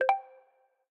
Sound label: UI Confirm